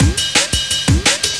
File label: Break, Loops; M8